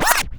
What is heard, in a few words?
back spin short, vinyl record